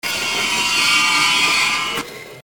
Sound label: machine scream